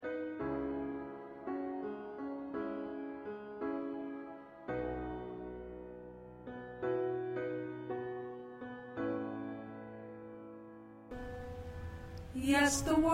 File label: Soprano, choir